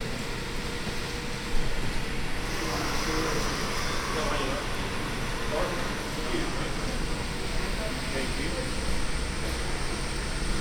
Air Purification Machine Outside Of The Emergency Room; Stanford Health Care